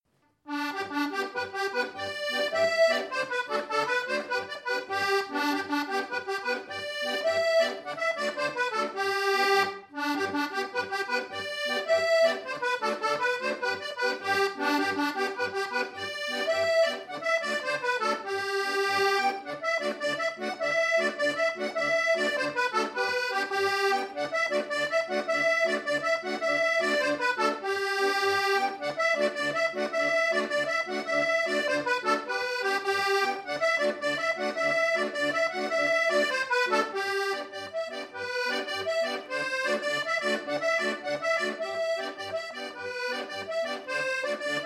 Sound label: Tarantella